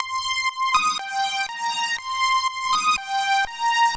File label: String, 121bpm, Nu Dance Electro; Zero-G; Soundclash; Acidized Wavs; Combi Loops; Slap n Tickle